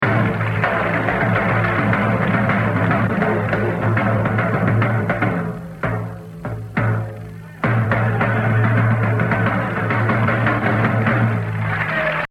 Solo, Jazz Drumming; The Evolution of Jazz Drumming (Gottlieb)

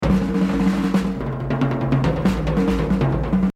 Exercise, jazz drumming; Rufus Speedy Jo; The Evolution of Jazz Drumming (Gottlieb)

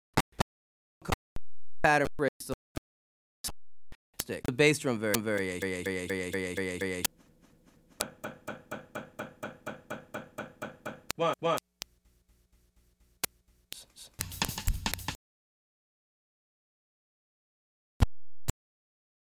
Afro-Cuban Grooves For Bass And Drums

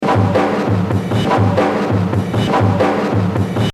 Exercise, jazz drumming

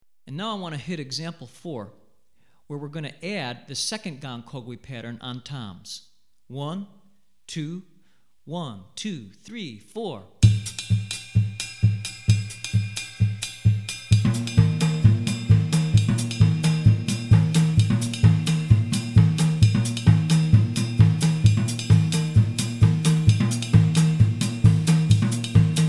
Drumset, West African Rhythms For Drumset; Gahu; Hartigan

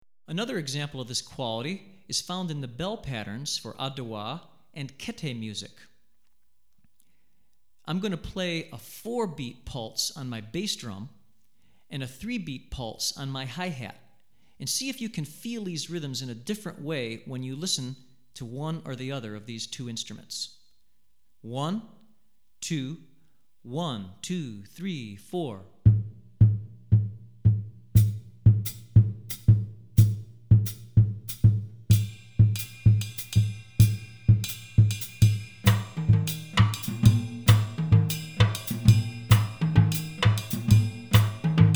Kete, West African Rhythms For Drumset; Adowa; Hartigan

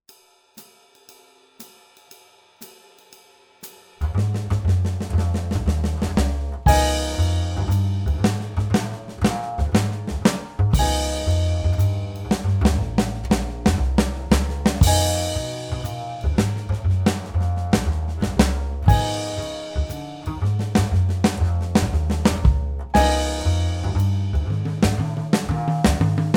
Swing, Drum Fills; Essential Drum Fills; Erskine

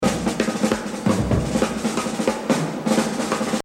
Exercise, jazz drumming; Exercise 24 Buddy Rich - 100; The Evolution of Jazz Drumming (Gottlieb)

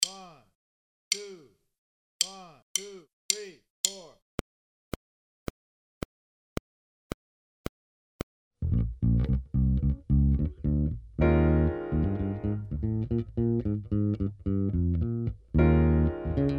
Pop, Drum Fills; Essential Drum Fills; Erskine

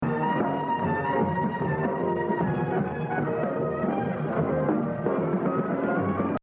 Exercise, jazz drumming; Exercise 13 Gene Krupa - 82%; The Evolution of Jazz Drumming (Gottlieb)

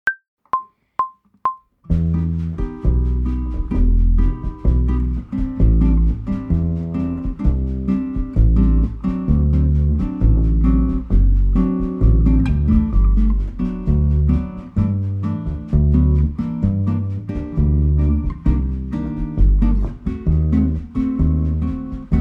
Bossa Nova 130bpm, Brushes; Phrasing and Freedom with Brushes

